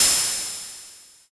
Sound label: crash cymbal